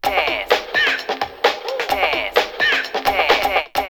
Rave drumloops 129; X-Static Goldmine; 90 s Sample CDs

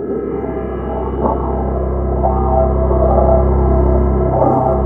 space junk, Roland JV80; Rubber Chicken Software Sample Bank

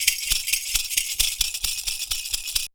PERCU; Univers Sons; Raricussions

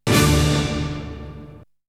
Hit; Hits; ZERO G - Datafile DISC 1

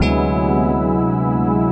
chord, 140 BPM; Drum & Bass; Ueberschall; Drum & Bass Resonance

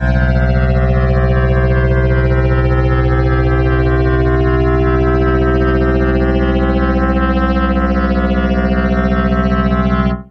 PROP; AKAI Anime Sound FX; SCI FI

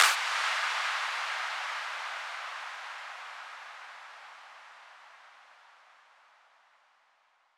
FX down filter; M8; Electronisounds; Effects

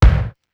SDS2000 BD, Simmons SDS2000; SDS2000 Factory8

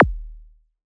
Cwejman S1 Zap right

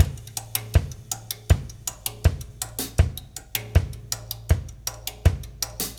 Russ Loop, Laidback

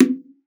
Bongos; ProSamples vol.42 - Session Instruments

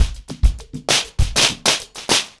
100BPM, ACOUSTIC DRUMS; Sonokinetic - The Roots Drumloops

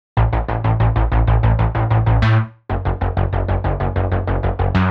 Bass 95bpm A; horrorscape

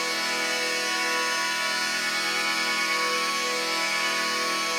Pad Loop E, shimmer and sparkle; Pad Loops

